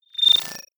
data transfer beam

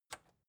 record player power release on

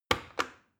plastic button start click heavy slow

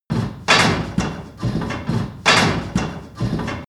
industry machine mechanic metal low

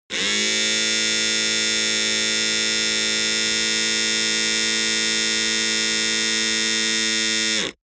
servo buzz on off long

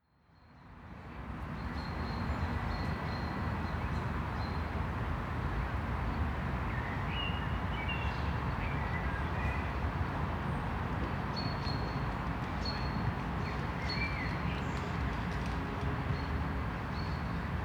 park birds traffic wind

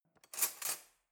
cutlery knife drawer grab

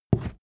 step wood step

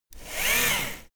servo move powerful

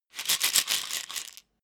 pillbox shake

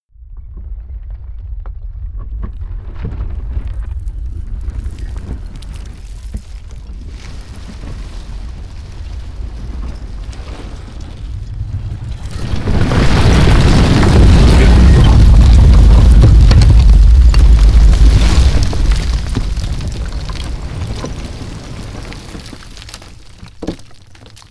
landslide

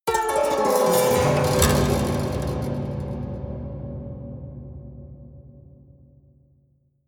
haunted strings down stroke fast hard

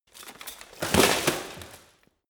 bodydrop

